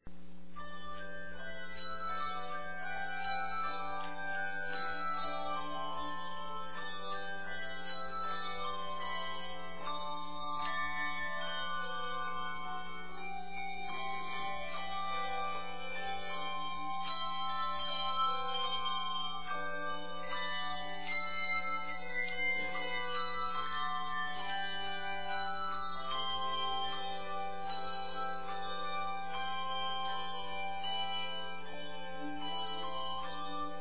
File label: Bell Choir; St Peter Lutheran; Risen Today